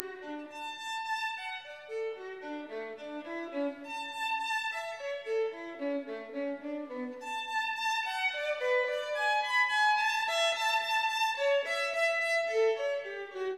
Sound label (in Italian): Bach ciaccona secondo stadio